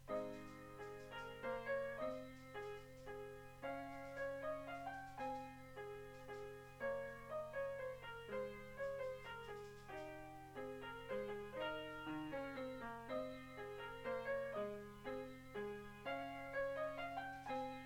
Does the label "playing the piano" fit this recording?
Yes